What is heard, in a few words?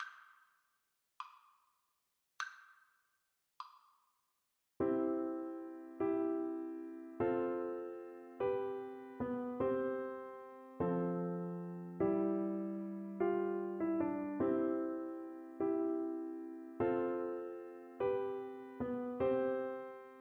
skye boat, XYL; Percussion